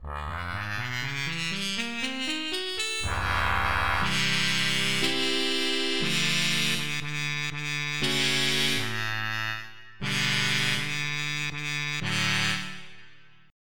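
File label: Muted Trumpet; FF7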